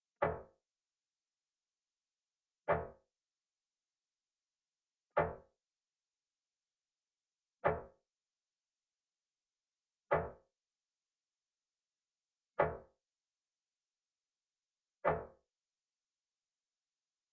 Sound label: Rimshot, 97 BPM E Min; Melodies; Loop Stems; Cymatics; 2022 Melody Mystery Expansion; Sleepless Night